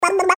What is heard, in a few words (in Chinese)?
宝宝喝水